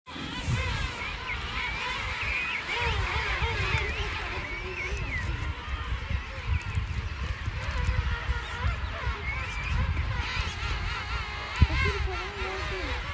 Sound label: Salisbury plain South Georgia afternoon landing